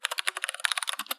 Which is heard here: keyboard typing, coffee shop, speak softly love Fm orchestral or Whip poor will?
keyboard typing